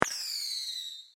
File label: Firework Whistle, Crack, Pop; Fireworks (Sound Effects:Backgrounds); SoundEffects-BlastWave